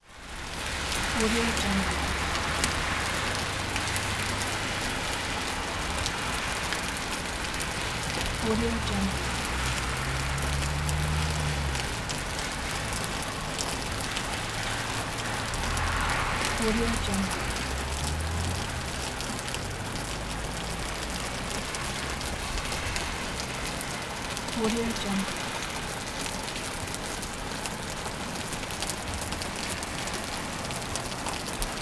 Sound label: raining on the street while cars are passing